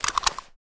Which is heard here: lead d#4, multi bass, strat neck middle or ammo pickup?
ammo pickup